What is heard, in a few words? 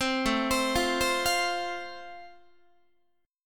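A Minor Sharp 5th, Guitar, chord strum; Am#5 arpeggiated; JGuitar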